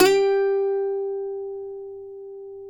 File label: cuatro; Spectrasonics; Hans Zimmer Guitars VOL-2